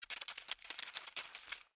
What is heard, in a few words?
Insect Walk Dirt, Insect, Walk, Dirt, Short, Movement, Bug; Blastwave; Animals:Insects